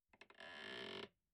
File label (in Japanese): 手コキ ASMR; 寝ているカノジョの隣で手コキ